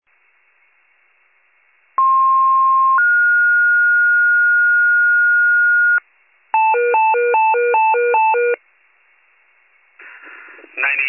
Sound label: fire, pager alert